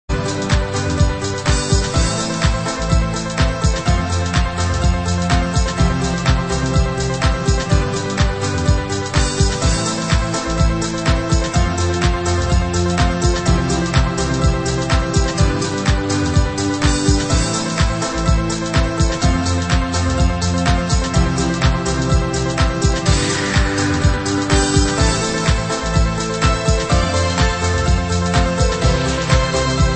Storm Sweet Remix (Synth Guitar)

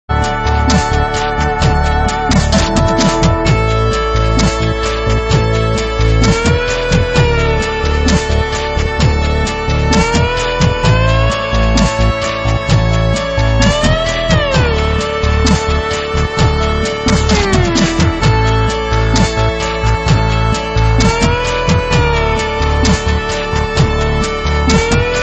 SID Overdrive mix; Last Ninja 2

